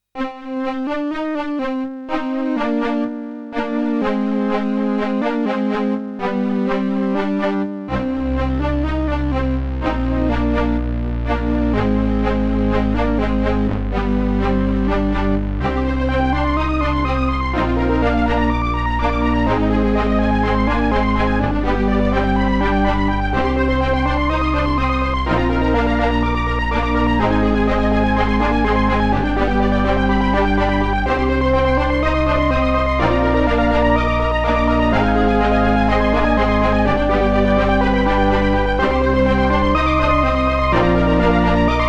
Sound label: Strings; noigesoft; Echos; AMPLE Music Archive